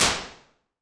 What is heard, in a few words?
Locker Room, impulses